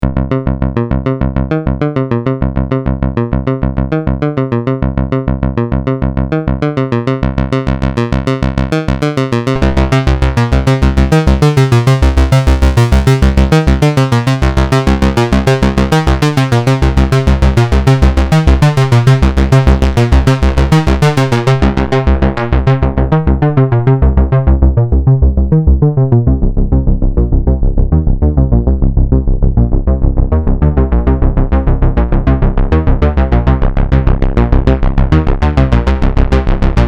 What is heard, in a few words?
behringer pro-1 bass seq